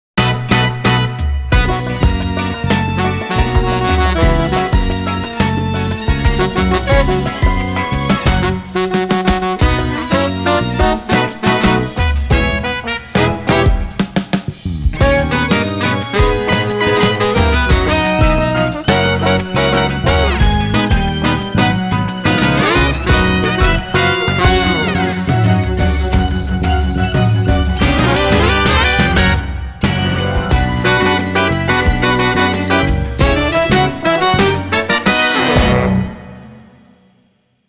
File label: Bows and Exit Music